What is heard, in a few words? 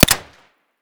pb silenced close; Escape from Tarkov